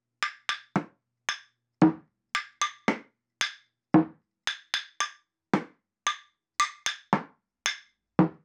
percussion loop 113 bpm; percussion loops; bespoke - Floral v.1

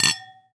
Glass clink; Foley; SFX; 7rystan's 600+ FREE EDM SAMPLES & DRUMS

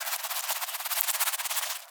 Shake Foley; SFX; 7rystan's 700+ FREE COLOSSAL EDM SAMPLE PACK

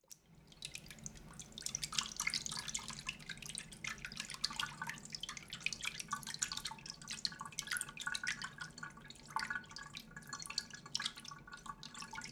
foley; bespoke - Floral v.1

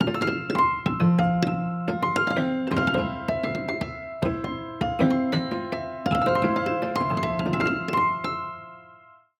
granular piano, sound design; radost; base pack

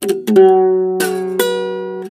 Badly tuned guitar; SFX; 7rystan's 600+ FREE EDM SAMPLES & DRUMS

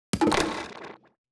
foley; bespoke - Floral v.2

